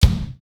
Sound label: kick, house